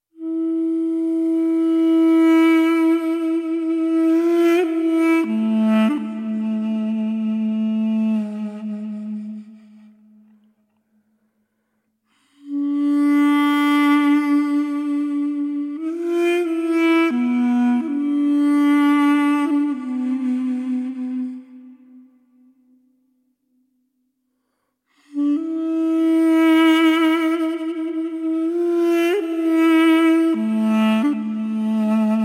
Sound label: Instrumental